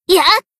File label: jump; azusa